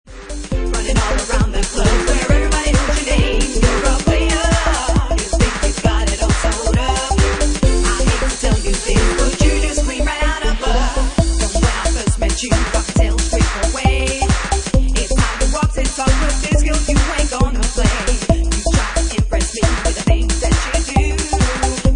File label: Organ Mix